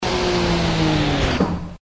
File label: SFX Stop Gate